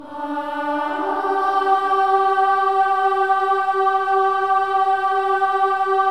Voices, INTERVALS, 4 UP; Spectrasonics; Symphony of Voices

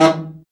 BRA BARI, Bariton Sax

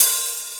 HAT; E-MU Formula 4000 Series Vol. 1; Hip Hop Nation; Bonus Perk

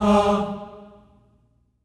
STACCATO G#3, Symphony of Voices; Spectrasonics

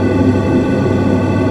NEPTUNOISE; Best Service Dream Experience

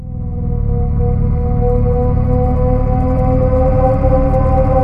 JV FX SPACE; Syntec; Wall of Sounds

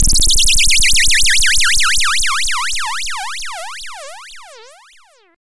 SYNTH FX

